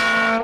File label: goofy bonk